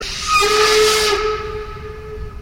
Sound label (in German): Lokpfiff, modellbahn